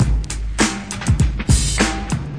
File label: 101 modern rap, DRUM LOOPS and BREAKS; 101 to 110 bpm